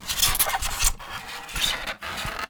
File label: Polystyrene Squeak